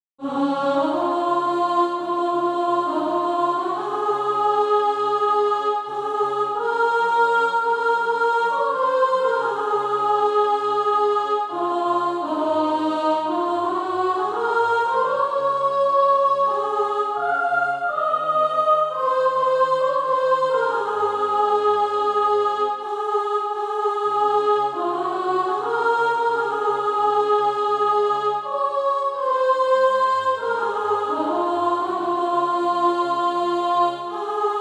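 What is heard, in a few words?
soprano, Practise Music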